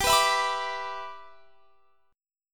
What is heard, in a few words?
G 6th; G6 strummed; x,22,21,21,24,22